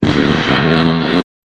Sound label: jingle